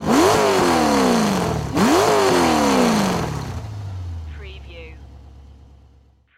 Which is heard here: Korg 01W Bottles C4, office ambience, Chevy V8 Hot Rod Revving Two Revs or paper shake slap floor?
Chevy V8 Hot Rod Revving Two Revs